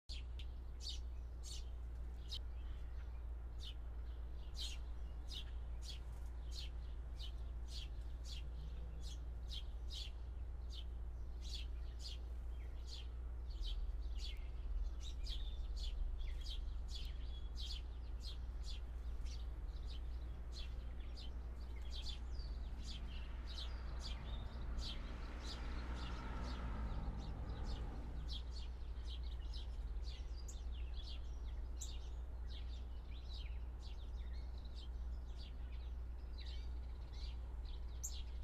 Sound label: birds